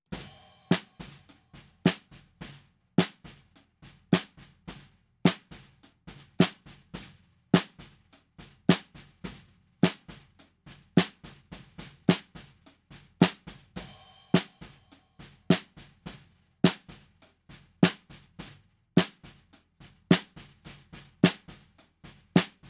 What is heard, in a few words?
snare bottom; logic